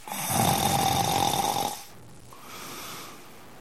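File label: snoring male prolonged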